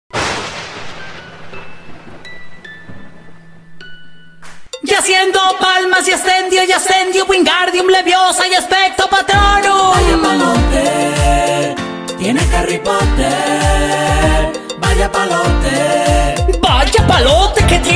cumbia; Harry potter

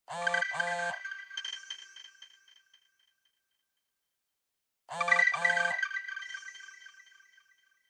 The Division Phone

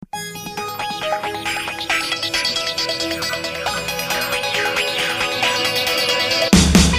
Electronical Tone

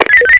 Walkie Talkie; SWAT

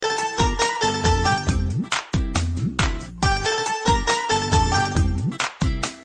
Message Tone

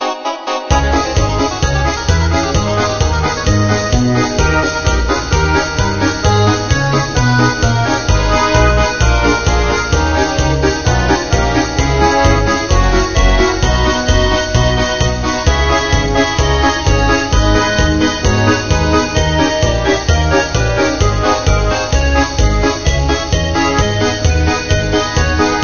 Ukrainian Folk Melod

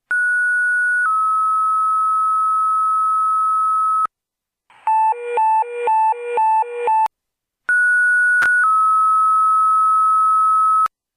fire tone; Priority 3

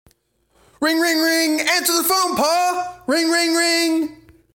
Ring old type

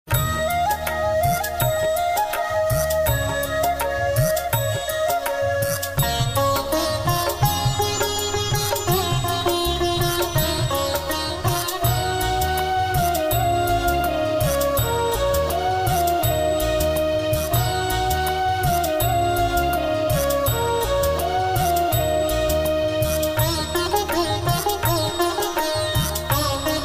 Veena

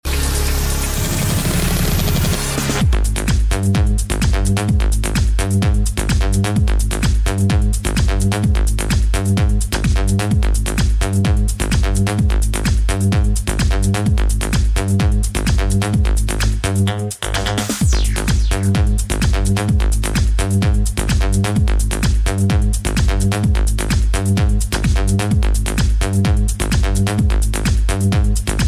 Electro

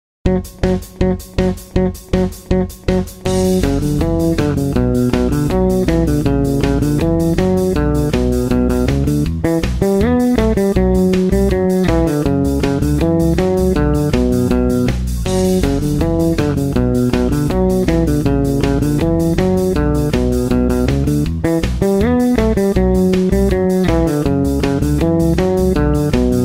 Tetris on bass

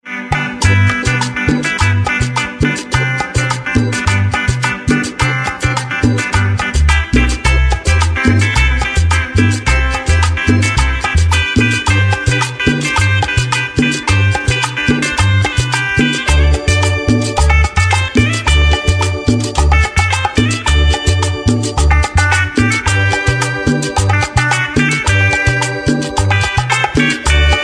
Colombia Cumbia

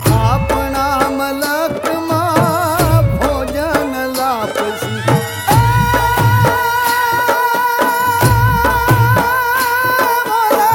Gujarati Folk Song

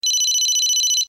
Ericsson high

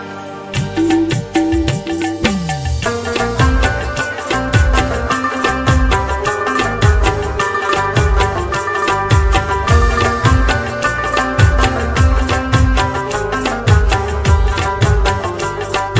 Rabab

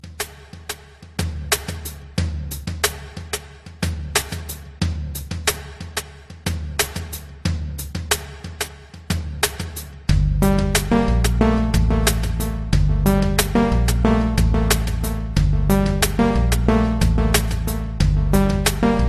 Drum Beats

